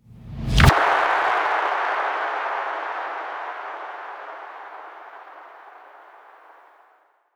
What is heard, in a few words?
impact